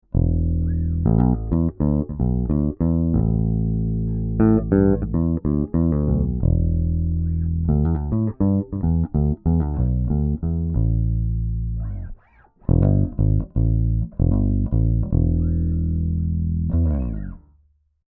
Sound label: API 512c DI Bass